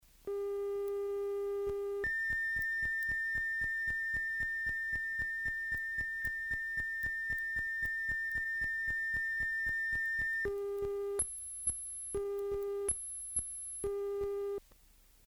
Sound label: autotuning decks tone v900x